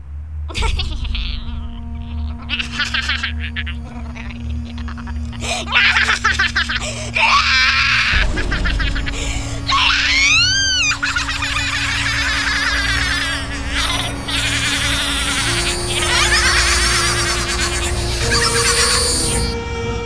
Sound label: laugh